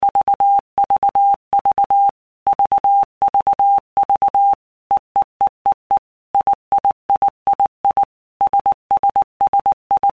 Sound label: EISH5; 1-minute Blocks